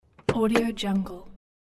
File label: car door open